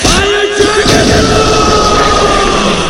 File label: Fire Dragon Roar